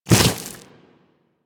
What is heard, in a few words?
Infested Small Land Dirt, Footsteps; Warframe